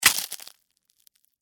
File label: Crack Crunch Short, Texture, Crack, Crunch, Short, Ice, Cream, Cone